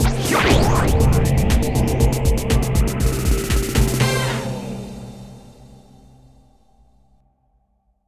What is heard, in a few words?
VIP Valhalla (Transition); Cooking Dash